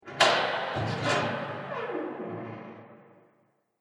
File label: Haunted House Door Open, Creepy Door Sound Effects